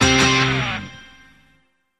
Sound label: Music Classic Rock, guitar